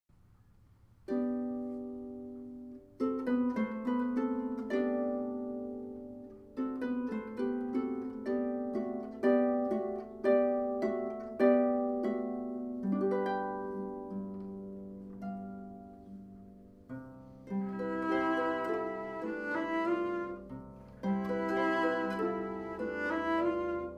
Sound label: Cello and Harp Duo; Flower Duet